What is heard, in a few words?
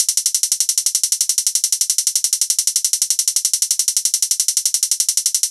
DnB 174bpm Hihats; EDM Drums; Drum & Bass; Stems; Ghosthack; Drum Mega Bundle; Ultimate EDM Drums; DnB Kit 10